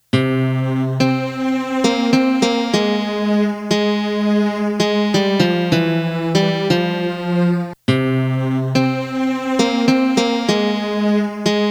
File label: melody; southern songs